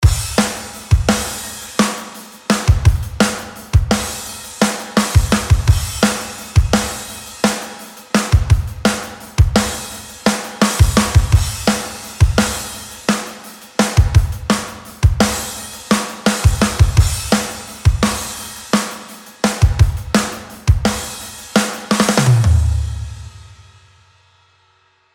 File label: DRUMS